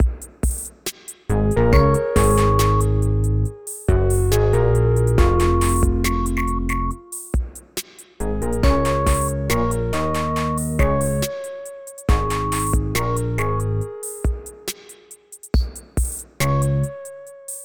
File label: morse song